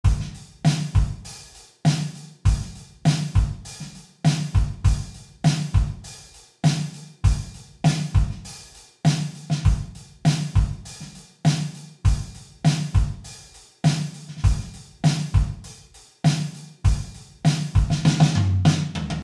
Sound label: Drums Room